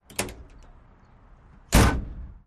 Car Doors Oc

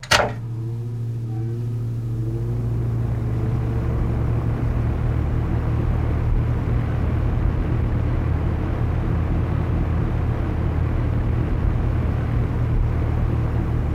Fan Strt Up Run Loop, fans; Fan Start Up And Run Loop

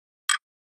Flash Beep Rattle

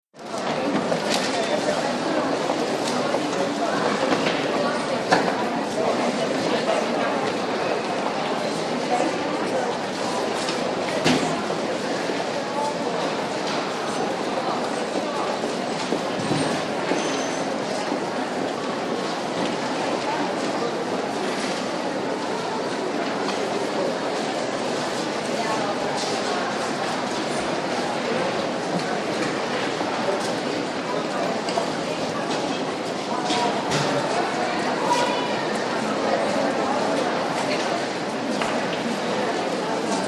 CROWD -STORES AND HALLS DEPT STORE: int.; Saks 5th avenue

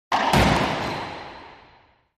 Racquetball Ball, racquetball

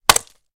Staff Wood Hit High Q, spears & staffs

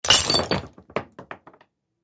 Jug Smash

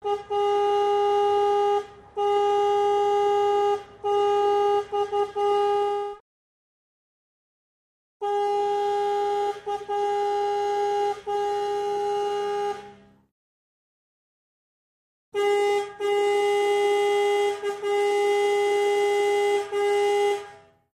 Fire Truck Horn Blast

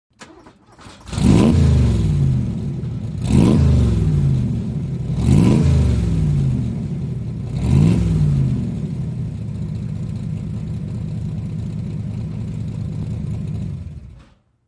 Cobra Start idle off, cobra

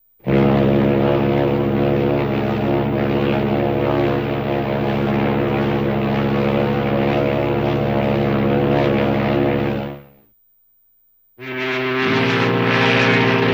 Boat Horn Deeper

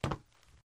Metal Runners Left, footsteps metal

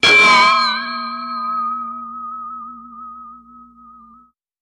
Ding, metallic hit with long ring - off; chimes & bells

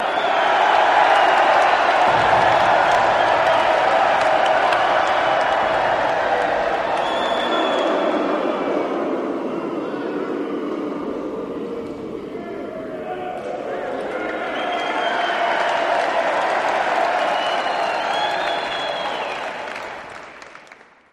Crowd Reacts, big arena

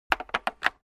Futzed Phone Receive, hang up

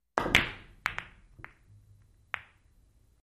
Billiard Ball Bankin

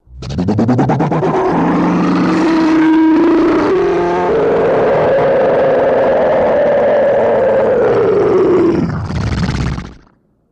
Gross Ugly Monster

